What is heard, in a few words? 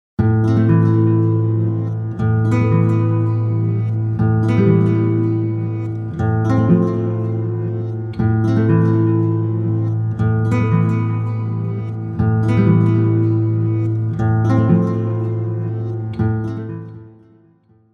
Acoustic Guitars; Scoring; Performance Palettes